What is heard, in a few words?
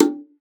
Bongos; ProSamples vol.42 - Session Instruments